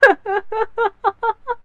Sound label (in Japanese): warai